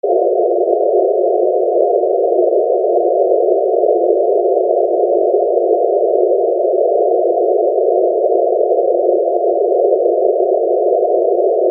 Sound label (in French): bruit rose